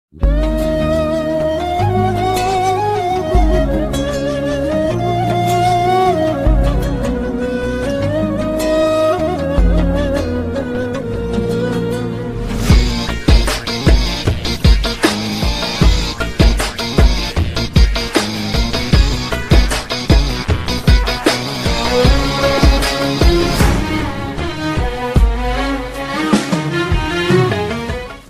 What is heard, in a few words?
Blues Turk Best Arabic Ringtone